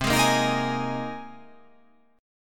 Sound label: C Minor 7th Sharp 5th C